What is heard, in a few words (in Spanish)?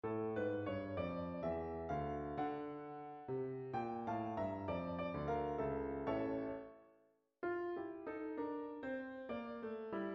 danza para voz e piano